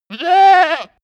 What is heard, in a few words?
goat baa